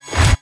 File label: big Win Ramp Up; netent